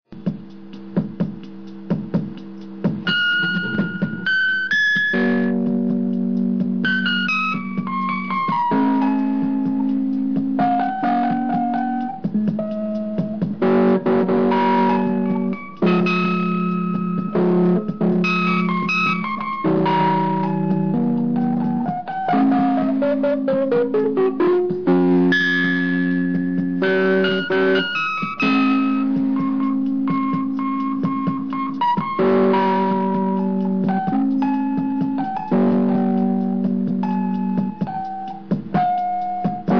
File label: piano batida